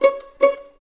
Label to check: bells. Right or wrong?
Wrong